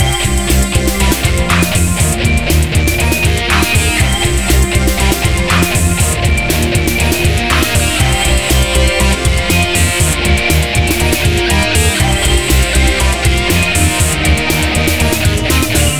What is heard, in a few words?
Music